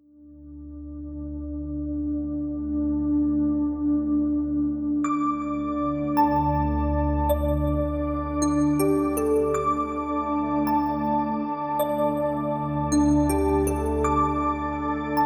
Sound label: instrumental